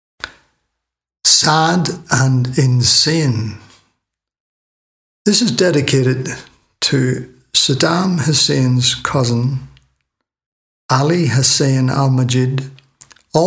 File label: sad and insane; WAR